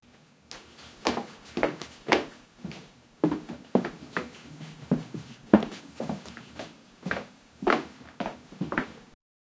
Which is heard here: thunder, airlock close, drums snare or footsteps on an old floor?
footsteps on an old floor